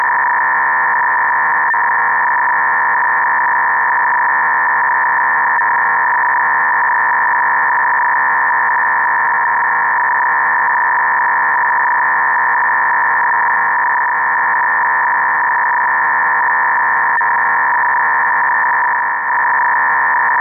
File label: rtty 8n2; horusdemodlib